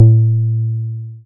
Bass SH-101

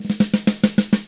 shuffled, Drums; ILIO; Double Platinum Drums 1

